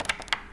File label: Lock